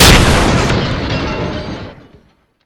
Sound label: break rock; svencoop; morass beta